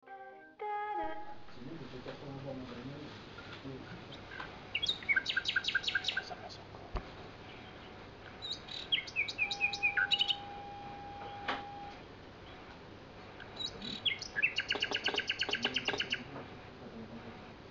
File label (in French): oiseaux